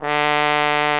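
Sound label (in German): POSAUNE VIBRATO; Best Service; Alpine Volksmusik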